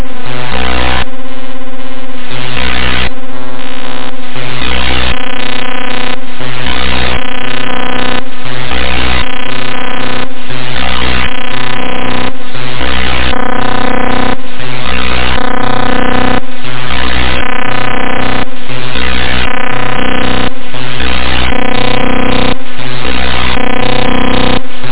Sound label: bytebeat